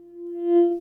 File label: whistler outgoing; DropCopy 1.77